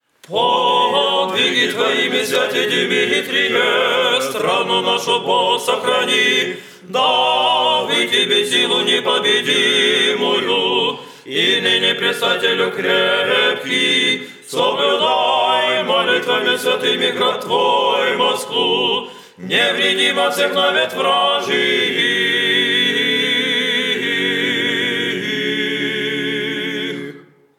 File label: kondak